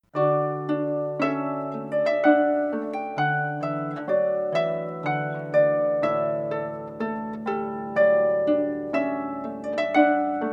celtic harp; Trumpet Voluntary; Jeremiah Clarke

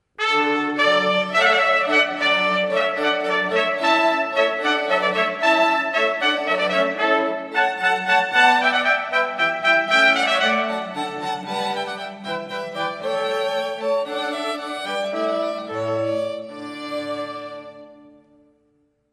Hornpipe, String Quartet 2 Trumpets; Handel; Classical

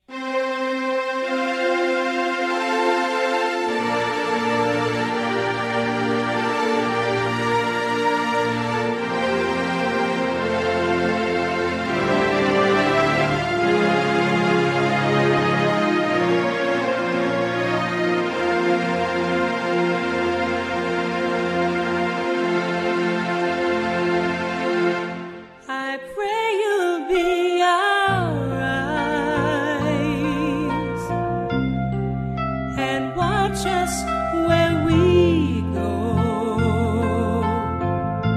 Duet; wedding officiant

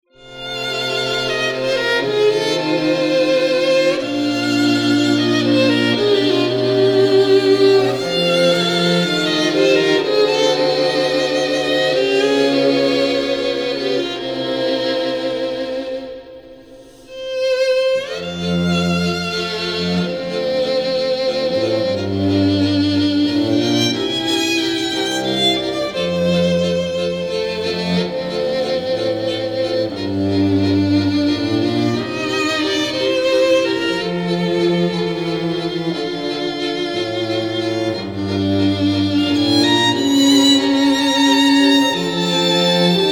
Quartet, classical string quartet

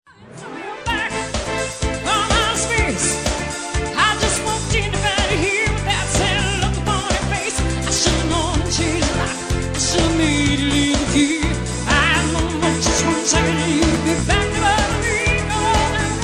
Live Band

